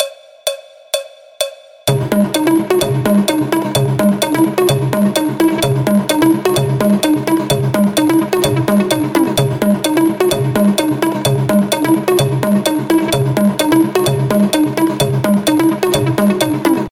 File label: Even More Cowbell